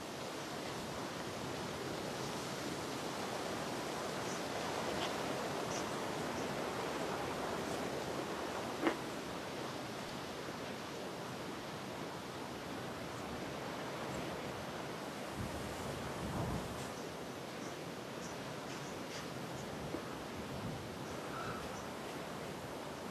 Amb House Ext

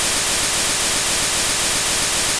Solar Beam Charge; sfx; attack moves; pokemon; gen 2; gsc

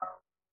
GUI Sharp Activate; The 39 Steps

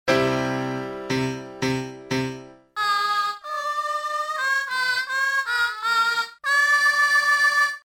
Singing; Mahou Gakuen Lunar; Saturn

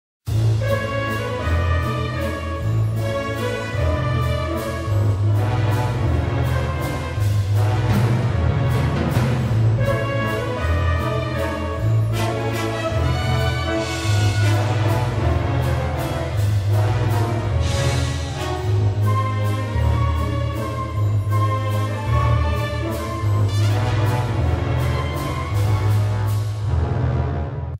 Bad Ballet Theme; Polaris

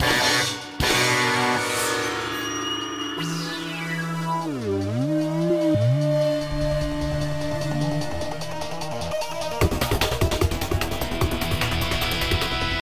Action START; bolt 2008 pc gamerip

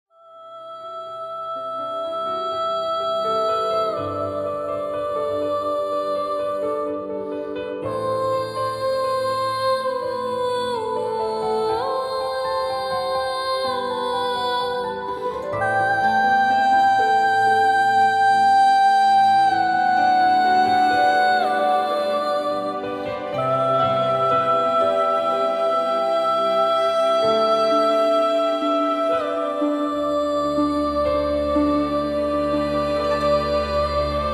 Field BGM; Xenoblade Chronicles 3

